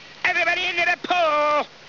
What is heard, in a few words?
pool